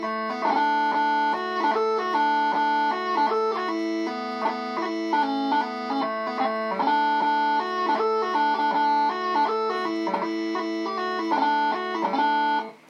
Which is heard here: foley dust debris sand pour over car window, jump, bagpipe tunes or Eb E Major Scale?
bagpipe tunes